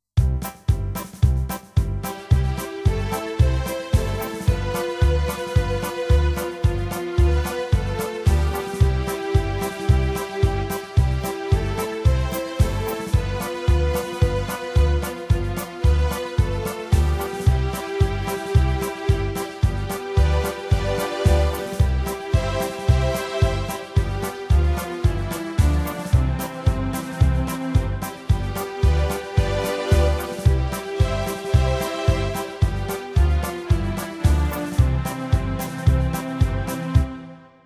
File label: March Music Reel